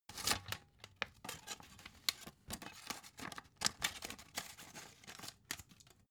cd cover booklet out

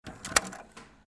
door lock

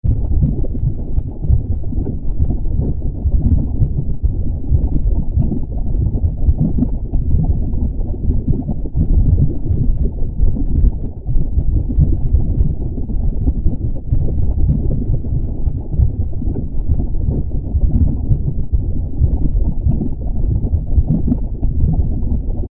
deep bubbling soft diffused

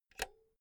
insert cinch

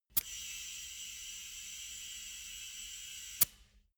tire pressure control deflate

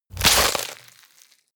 rip bone and flesh short

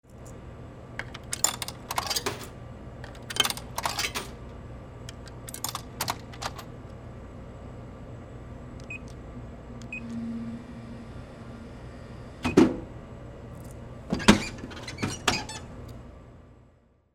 food vending machine

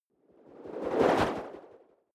whoosh simple flutter futuristic

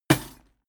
impact chain leather

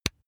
razor attachment mount

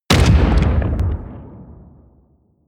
artillery light close

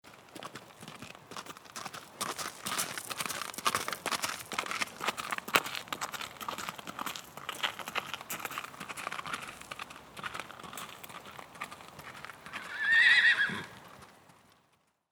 horse gallop on gravel

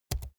keyboard laptop key press fast soft

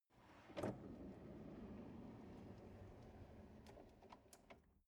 drawer medium close draw long

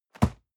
box hit medium

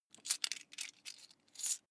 coins big clatter

